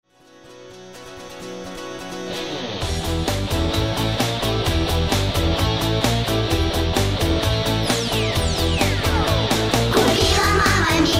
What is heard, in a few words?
Venus guitars